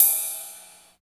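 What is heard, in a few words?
RIDE CYMBAL; East West - Phat & Phunky CD2; 90's Sample CDs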